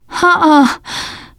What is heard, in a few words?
negative noise, teengirl; player; survivor; voice